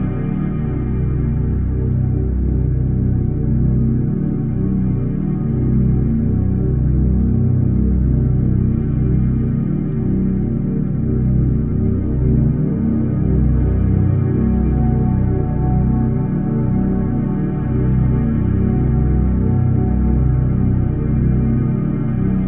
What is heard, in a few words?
inner light loop; ゆめ2っき